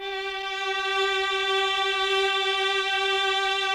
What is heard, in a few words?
CHAMB STR G#3; Sonic Reality; Vintage Timetraveler